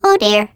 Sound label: witness death, vocalization; carbon miku; player